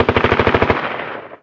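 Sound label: gun m16 distant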